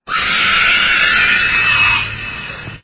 nazgul scream

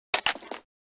weapon pickup counter strike

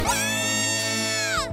screaming; smg4; meggy

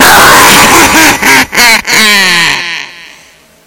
jumpscare sound

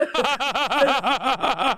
cringe fake laugh

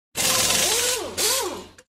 air wrench

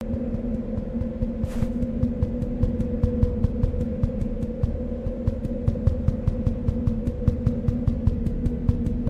walk; backrooms

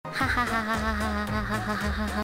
hahahahahahaha, laugh; fenneko

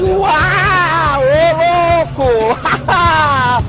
uaaaaaaahhhhhh

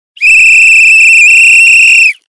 whistle blow sound

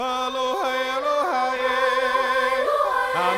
roller coaster ride

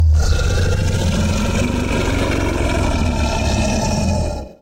rumble

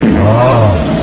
snoreagaggagag

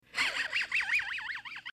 pup laugh

